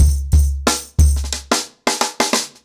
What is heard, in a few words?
Nuclear 90BPM, ACOUSTIC DRUMS